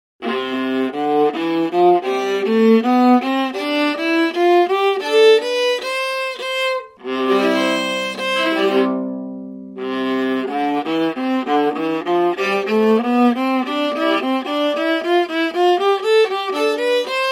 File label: viola c scale